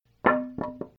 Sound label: Foley Wood Sword Fall